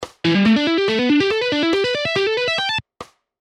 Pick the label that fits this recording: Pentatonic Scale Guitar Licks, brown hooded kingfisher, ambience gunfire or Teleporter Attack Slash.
Pentatonic Scale Guitar Licks